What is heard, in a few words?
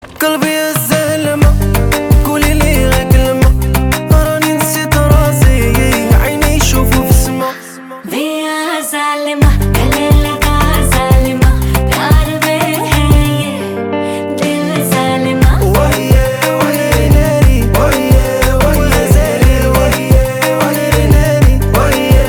RINGTONE